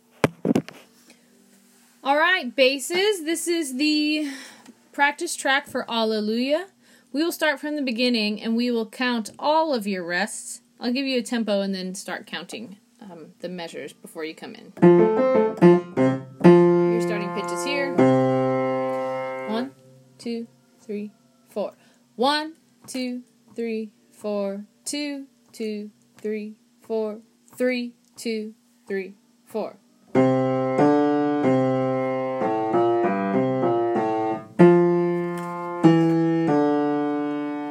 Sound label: Alleluia Bass